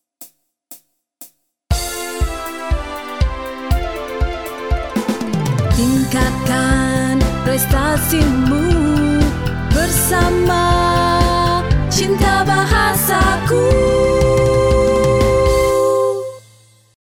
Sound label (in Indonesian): Tune Penutup Iklan